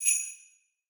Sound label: sleigh bell